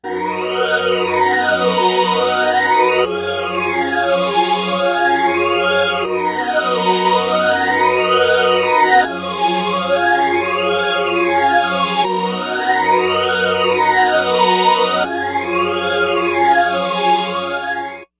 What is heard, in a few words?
stringtron pt9pro